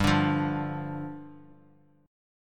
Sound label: D Minor Double Flat 5th, G